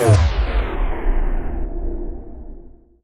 hammer; omniknight; hammer of purity target

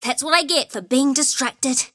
death; dota; hoodwink

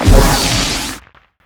cask cast; witch doctor

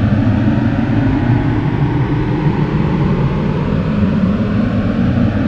sunray beam loop; weapons; dota; phoenix

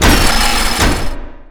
hookshot fire; weapons; dota; rattletrap

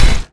thump, large blade; weapons; dota

